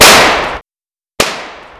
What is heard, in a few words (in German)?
Schuss